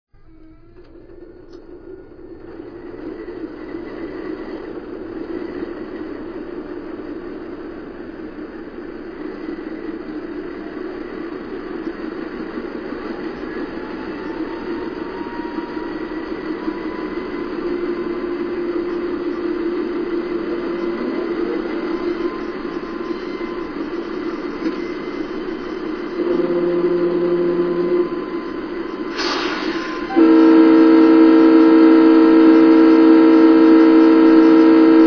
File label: AC4400CW CSX